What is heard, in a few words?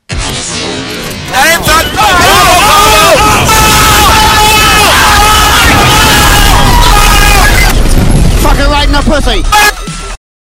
follower alert audio test